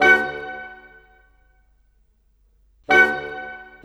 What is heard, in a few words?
Cinematic Winds; Sonic Foundry (Sony Creative Software); Orchestral Series VOL-3 - Cinematic